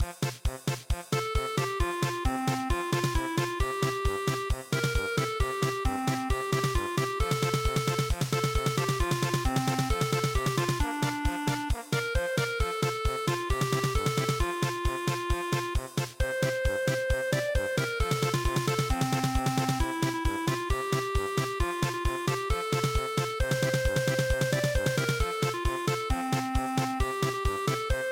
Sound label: yamanoue polka